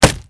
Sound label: wood; Mafie 1